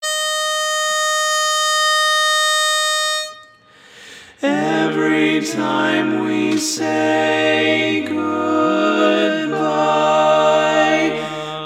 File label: Lead, Barbershop